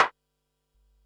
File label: Perc, Percussion and FX; Pearl SC-40